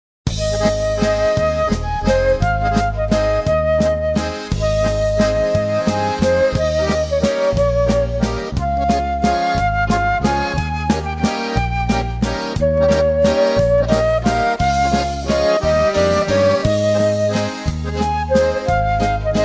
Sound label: waltz